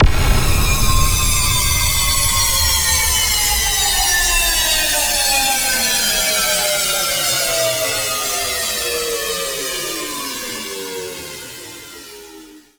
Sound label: deactivated impact; shimmer